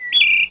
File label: wood